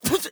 Death; Owl

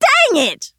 Engineer Heavy Wound; battlerite

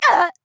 Death; Tyra

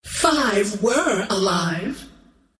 scream count; Queen of Pain

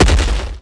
golem footstep; Warlock's Golem

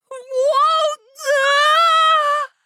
Falling; Inara; Paladins

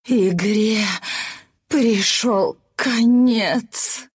pain death; dota2

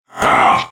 Grunt Attack; Makoa

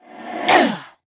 Grunt Fast; Realm Royale; Hunter

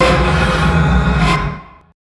Spell Steal

